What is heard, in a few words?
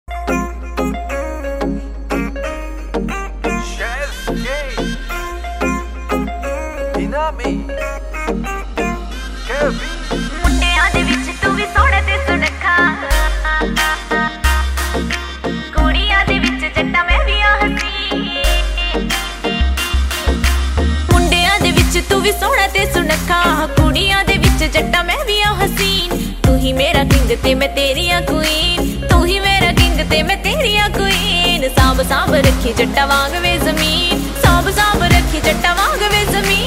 Latest Punjabi Video Song